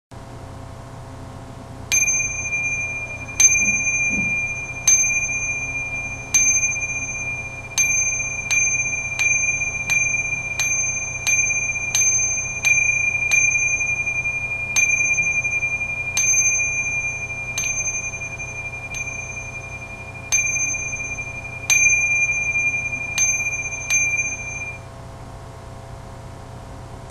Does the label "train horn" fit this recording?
No